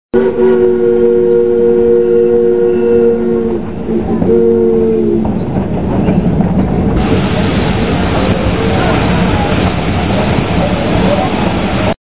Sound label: whistle and hiss